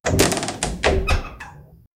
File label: door open sounds effects